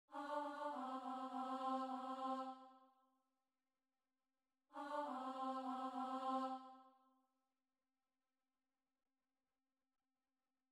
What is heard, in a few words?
All is Merry Alto